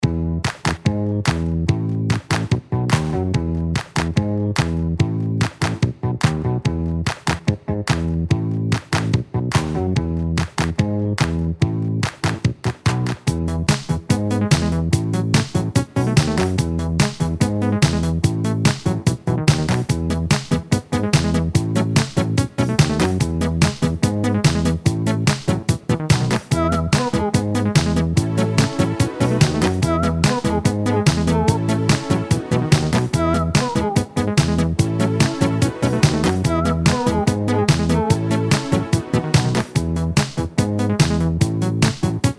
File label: game music; Farm Frenzy